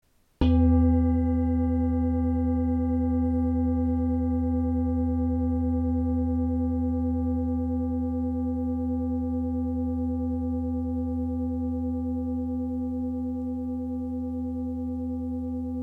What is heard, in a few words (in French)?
Bol Saraswati